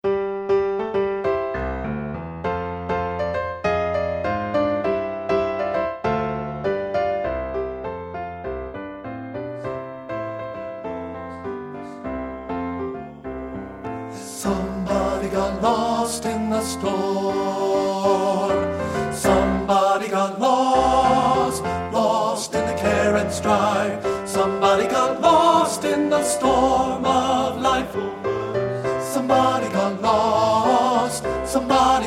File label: TEN1; men; partbypart